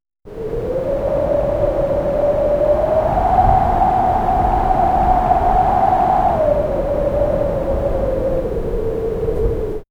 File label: wind light breeze open fi, field